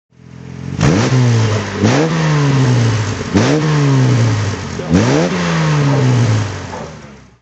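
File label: E-type 4.2 s15 throttle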